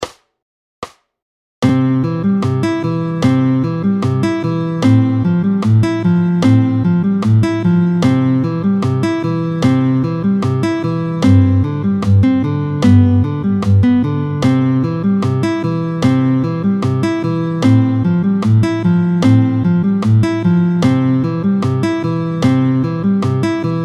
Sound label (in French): Vite tempo 150; 16-01 Les doigts dans le nez. Vite 2 2, tempo 150; exercice chapitre 16